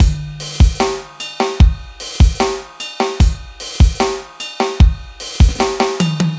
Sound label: Clear Drums C